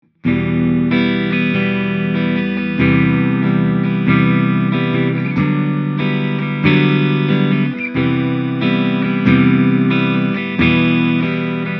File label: ESP LTD Vulture clean strumming neck; james hetfield signature